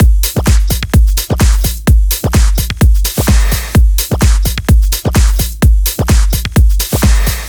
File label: 128BPM Dogde Kit